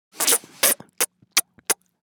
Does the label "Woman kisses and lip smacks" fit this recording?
Yes